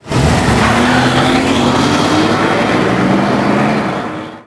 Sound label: van start off outdoors; garage; Max Payne